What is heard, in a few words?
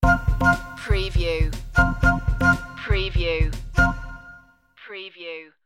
sting